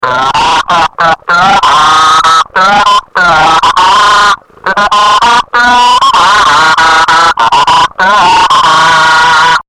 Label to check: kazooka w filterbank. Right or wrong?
Right